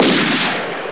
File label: shot